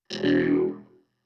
Neo-Wave Vocoder; Pop Rock; Pro Tools Essential Demos; Digidesign; Essential Loop Demos; Demo Session - Pop Rock

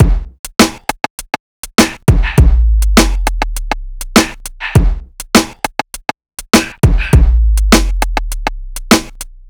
Hip Hop Beat, Drums

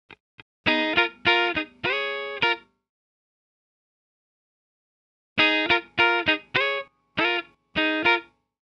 101 Pop Guitar Fills Db maj, Electric; Pop Rock; Electric 100-160 bpm; Pro Tools Essential Loops; Digidesign

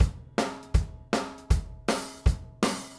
160 Country Shuffle Drums, Very Fast Tempo; Pop Rock; Pro Tools Essential Loops; Digidesign

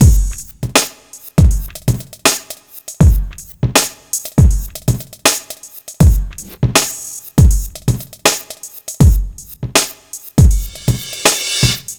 Hip Hop Beat, Drums; Digidesign; Pro Tools; Pro Tools Essential Loops

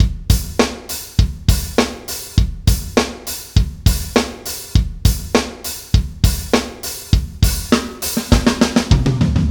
101 Indierock Drums Fill; Pro Tools Essential Demos; Essential Loop Demos; Demo Session - Rock Hard; Digidesign

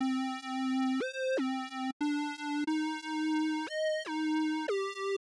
HipHop Synth F min; Digidesign; Pro Tools Essential Loops

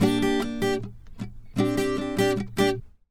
Pop Ac-Guitar Dmin; 077; Pop Rock; Acoustic Guitar; Pro Tools Essential Loops; Digidesign

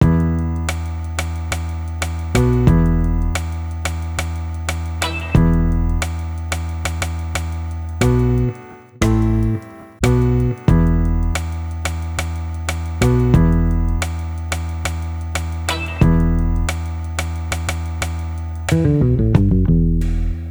090 HipHop Instrument Combo E min; Digidesign; Pro Tools Essential Loops

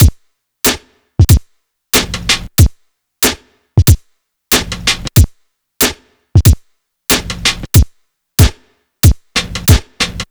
093 West Coast HipHop Drums; Digidesign; Pro Tools; Pro Tools Essential Loops

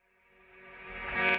Chill Pop Echo Guitar F#; Pop Rock; Pro Tools Essential Demos; Digidesign; Essential Loop Demos; Demo Session - Pop Rock

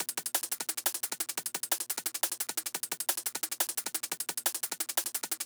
175 D&B Drums

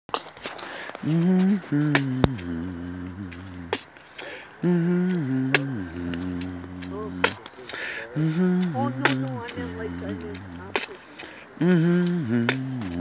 rap!

